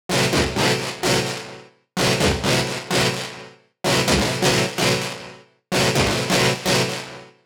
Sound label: Techno Chords